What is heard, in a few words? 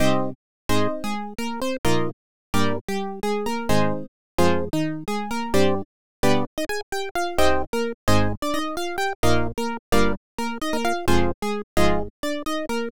synth dry